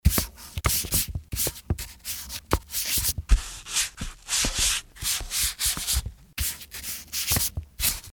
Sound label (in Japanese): fude